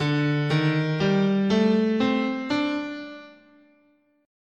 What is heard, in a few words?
D Traditional Japanese "in sen", scale